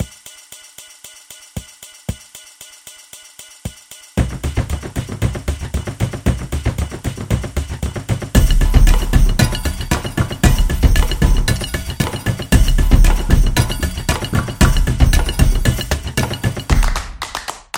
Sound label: Commercial Energetic